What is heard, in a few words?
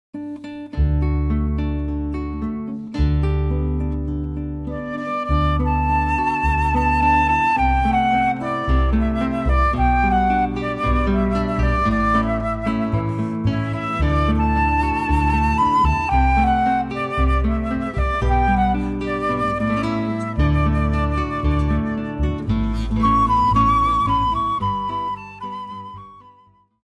guitar flute bass trio